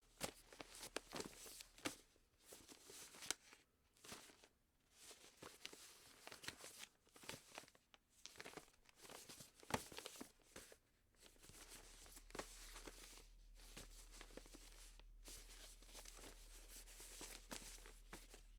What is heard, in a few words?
flipping newspaper pages